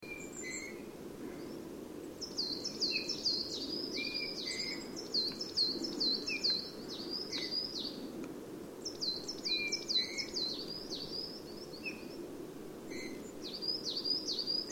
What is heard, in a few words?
Pyrrhula pyrrhula; SETE